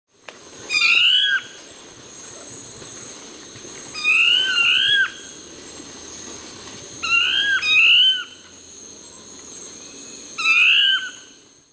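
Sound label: dusky thrush voice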